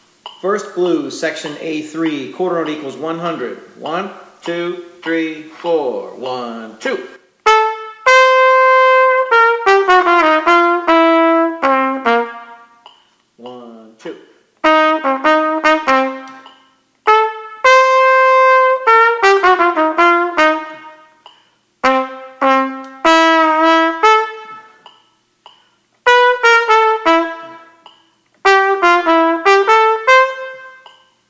Play-a-long First Blues with click, qn=100, trumpet; 12 Medium-Easy Etudes